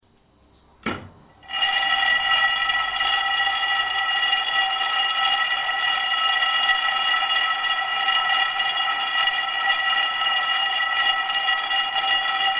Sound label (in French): noisettes torrefiees